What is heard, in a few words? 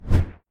ui swipe